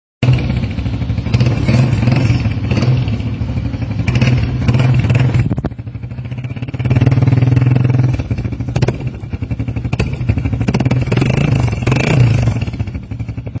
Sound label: Shirazi exhaust